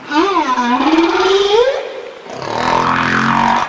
baleine

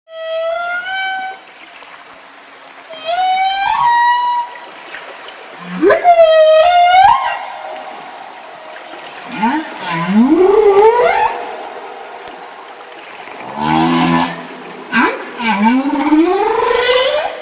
baleine A Bosse

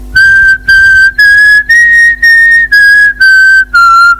sifflement

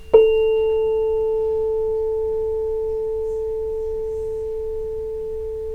diapason

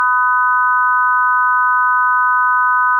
accord 1kHz

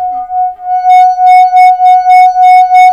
verre frotte

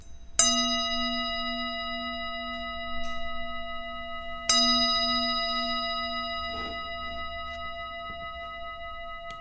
bol Tibetain

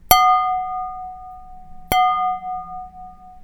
verre choc

